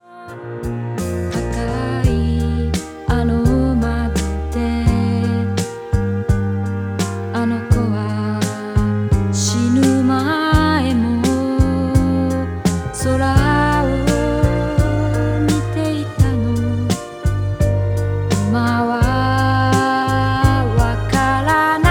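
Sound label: jpop female; stylus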